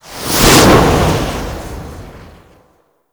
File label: Grineer Manic Charge Sprint